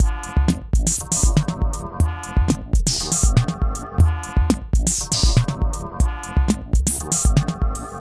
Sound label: Cartoon